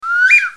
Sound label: reception sound